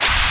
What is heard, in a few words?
slide